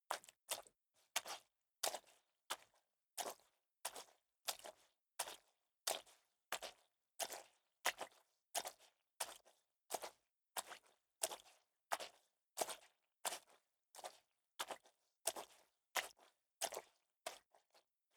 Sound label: step water walk sequence